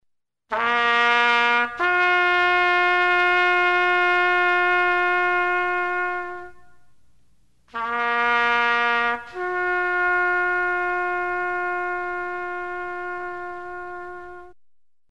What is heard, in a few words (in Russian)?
gorna kotoryj soobschaet o porazhenii proigryshe v boju i podnjatii belogo flaga